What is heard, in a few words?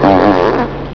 FART; Nare Gewoontes